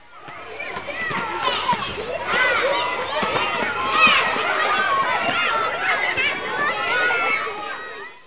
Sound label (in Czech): rekreace; pavouk